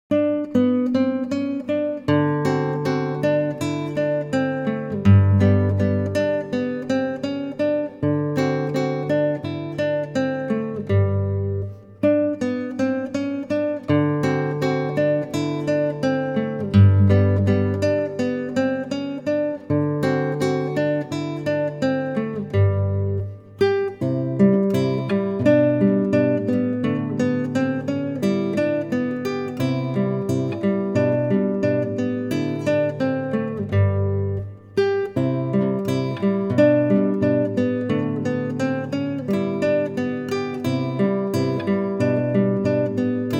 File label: Gallop, guitar